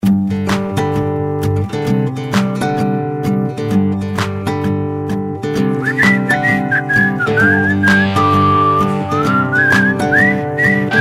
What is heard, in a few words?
Whistle your cares away; Samsung Galaxy Pocket